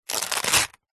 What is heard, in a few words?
foley paper bag small thin rip tear; zapsplat